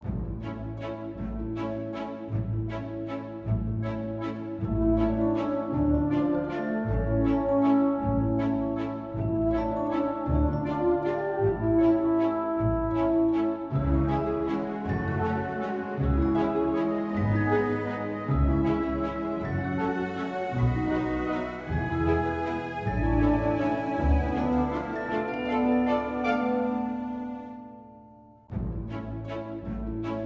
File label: Waltz